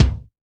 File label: BD DBL; EdgeSounds; Drum Mashines; R-8 DRUMS